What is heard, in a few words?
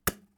Arrow Impact